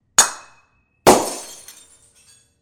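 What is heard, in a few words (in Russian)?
light bulbs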